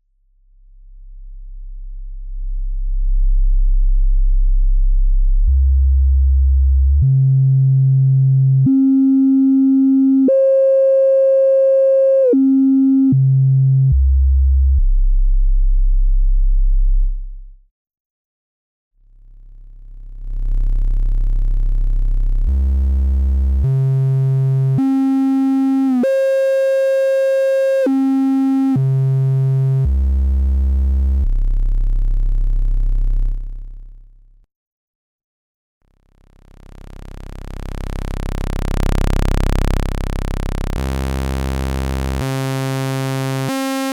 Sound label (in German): ERICA SYNTHS Black System Black VCO Sinus Dreieck Sägezahn Pulswelle